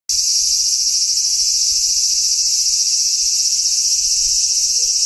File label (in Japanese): aburazemi, cry of insects